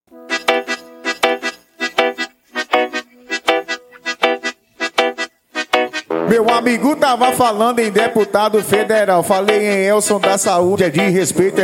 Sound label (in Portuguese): musica